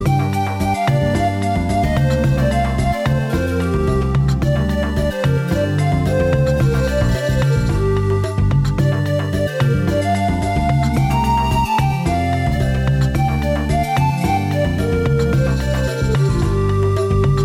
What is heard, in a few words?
freegames ambient; Lucky Queen; Wazdan